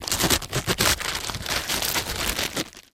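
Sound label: sounds of lettuce leaves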